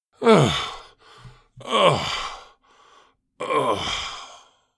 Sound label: Exhausted old man breathing